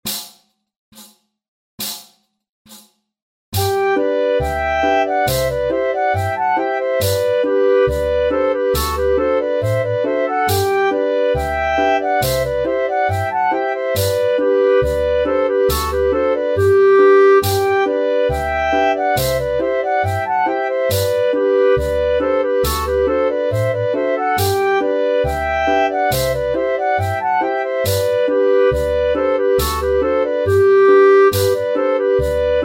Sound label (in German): Belgischer Schottisch